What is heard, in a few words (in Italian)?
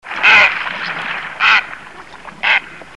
Airone guardabuoi